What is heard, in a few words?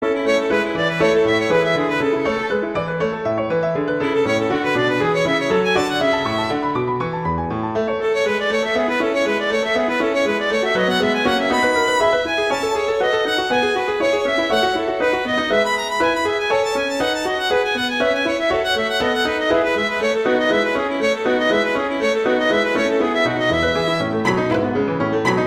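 Toccata, Viola Piano; P.D. Paradisi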